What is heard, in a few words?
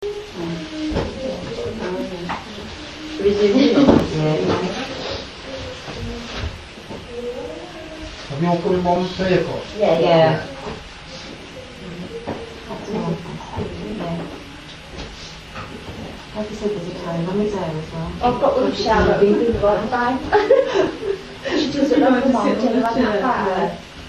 female singing